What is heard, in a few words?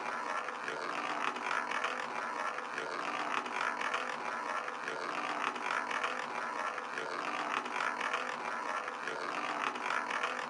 ball Loop Outer, roulette; advanced-roulette